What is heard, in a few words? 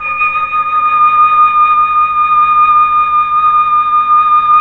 FLT, Melotron Flt, Slow Flutes; Roland LCDP09 Keys of the 60s and 70s 1